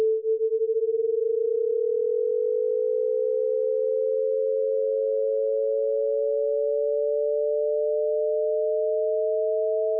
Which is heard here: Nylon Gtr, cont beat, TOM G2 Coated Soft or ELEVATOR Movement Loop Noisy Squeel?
cont beat